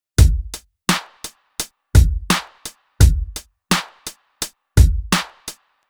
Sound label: drum 8beat sample